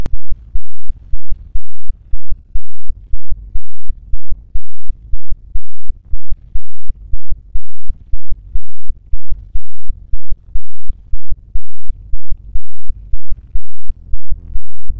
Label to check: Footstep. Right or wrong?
Right